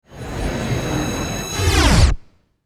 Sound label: sucked into black hole